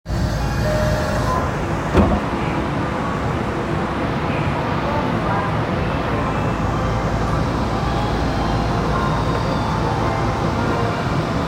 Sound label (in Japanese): 銀座線上野広小路西, train sounds